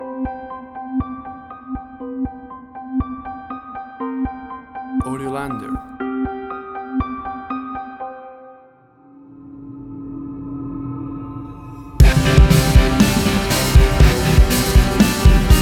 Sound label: Action Trailer Sounds